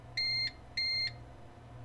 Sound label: Beeping Two Short Beeps; CyberPower